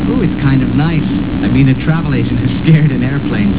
Oooh; "Ooh, it's kind of nice..." - Flash; Flash Gordon

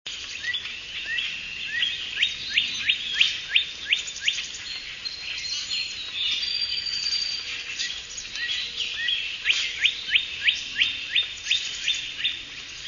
cardinal; birdsongs

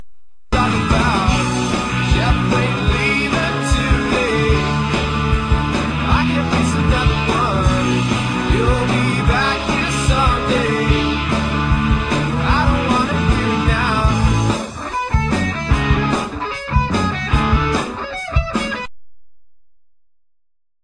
jet

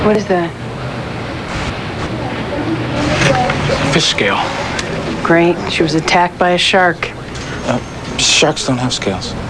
scales

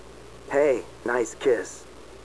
Nice Kiss

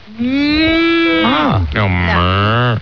cow

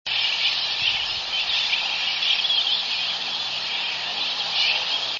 tern sooty

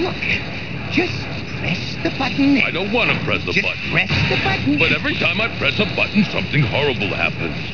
button horrible

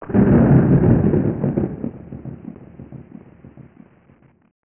Thunder

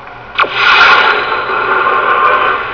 portal open

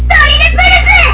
purr; Drill Purrus

